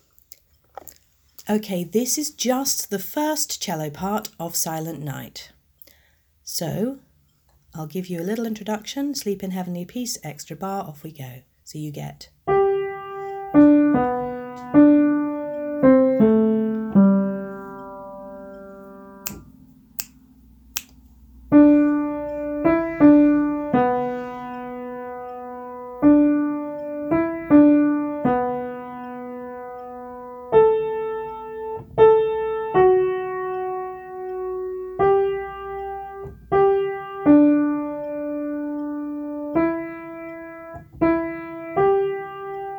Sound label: silent night top part, cello